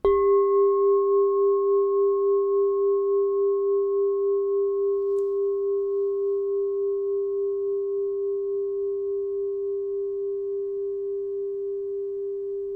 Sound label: 6inch G +24 chime